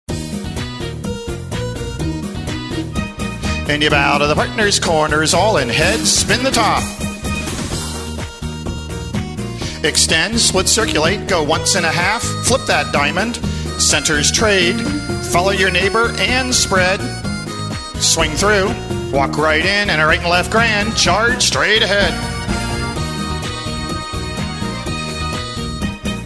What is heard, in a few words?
vocal sample